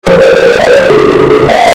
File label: screamer; shadow freddy; cupcake